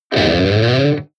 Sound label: electric guitar distorted slide up